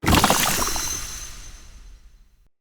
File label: Sap Crate Destroy; Neathuns